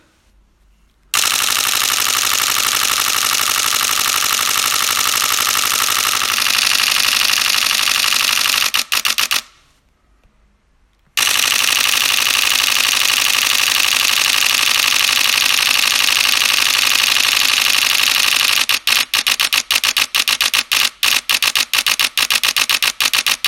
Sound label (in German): D5 12 Bilder Sec; Nikon D5